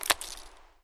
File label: Click select